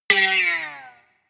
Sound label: spring